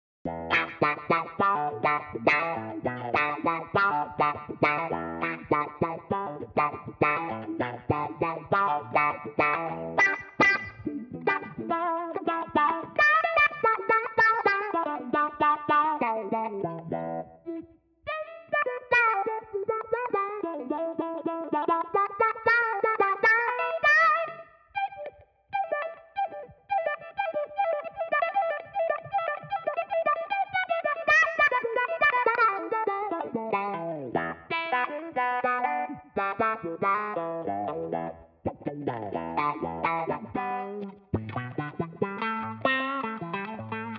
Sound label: axefx autowah